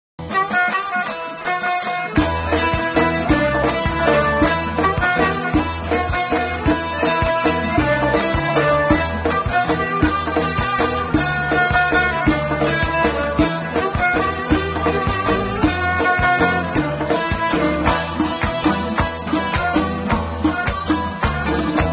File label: remix chaharshanbeh sori remix; radio javan